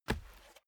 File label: step barefoot wood plank single left; schritte